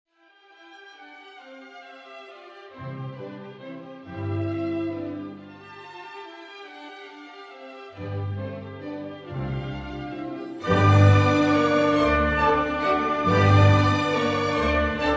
Symphony No. 41 in C Major, K. 551 III. Menuetto - Allegretto; Jupiter